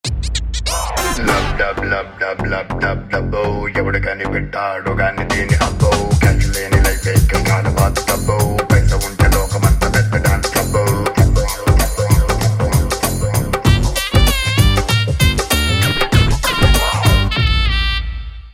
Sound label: Song BGM Ringtone; F3